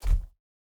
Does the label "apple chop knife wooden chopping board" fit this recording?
No